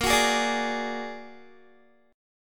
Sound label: Bb Augmented 7th Bb Guitar, chord; Bb+7; strummed; 18,21,18,19,20,x; 4E,4A,5D,5G,5C,6F